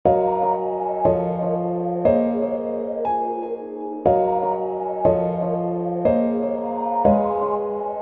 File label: piano; foundations